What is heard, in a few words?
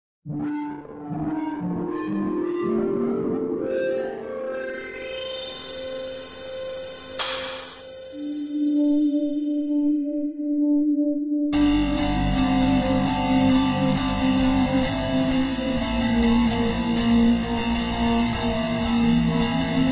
dark pulse title screen